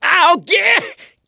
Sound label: scream while pounced, mechanic, survivor